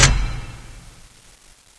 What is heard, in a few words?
throw switch; XDM; buttons